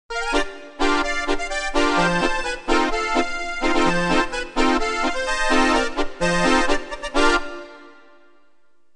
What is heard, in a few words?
FS1R Accordion